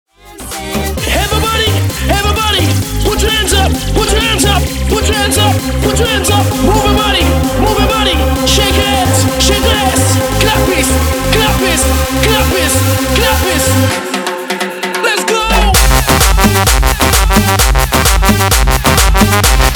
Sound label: dancin tribal remix 130 bpm